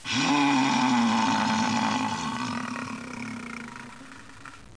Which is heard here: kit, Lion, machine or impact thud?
Lion